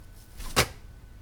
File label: cloth; 濡れタオルを落とす音